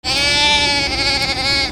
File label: ANIMALS